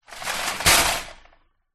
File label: shopping cart metal roll push into wall or other object; foley; zapsplat